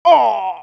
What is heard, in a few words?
damage, pain; dialogue; mohaa